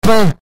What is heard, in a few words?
pain